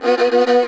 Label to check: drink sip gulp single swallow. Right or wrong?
Wrong